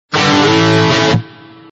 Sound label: Msg Tone